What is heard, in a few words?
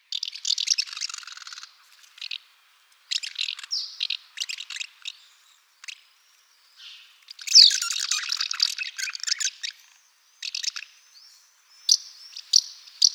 Delichon urbica